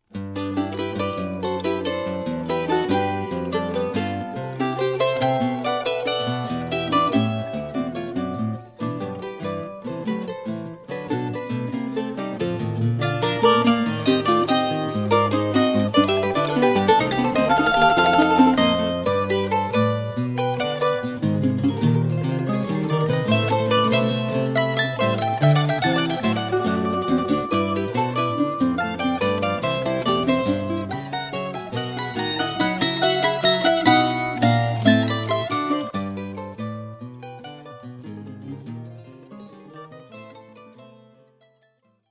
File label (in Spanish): merengues venecos